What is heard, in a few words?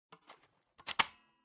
Floppy Disk Drive Insert